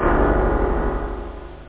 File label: PIANO; da capo vol1; instruments; synth; chords